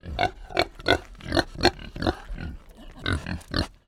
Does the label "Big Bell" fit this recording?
No